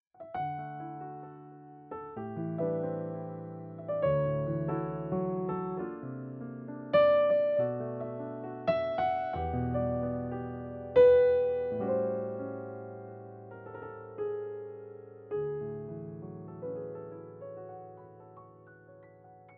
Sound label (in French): generique de fin